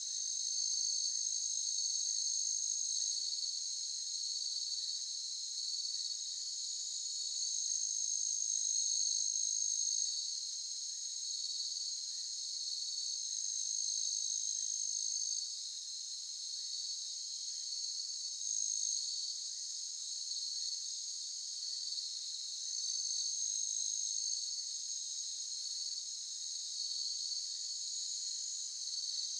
cricket ambience evening jungle distant bird vietnam; Sonniss GDC